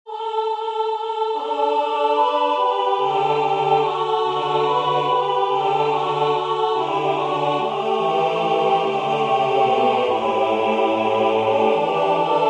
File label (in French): trois petites notes tutti, Chants